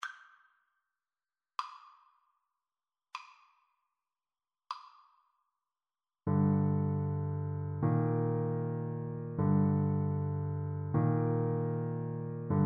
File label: funeral march, Tenor Saxophone